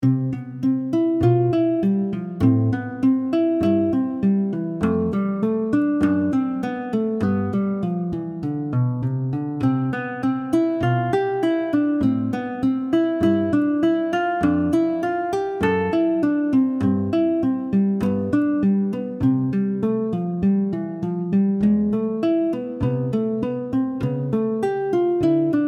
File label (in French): Etude en La Min